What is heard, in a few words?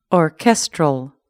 orchestral